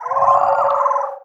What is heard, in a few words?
idle, headcrab reviver; creatures; vox